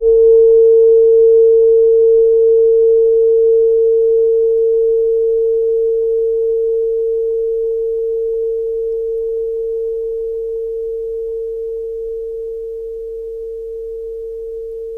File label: chime Full Moon, gongs